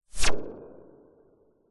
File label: whoosh fast hissy low fi; sound design; zapsplat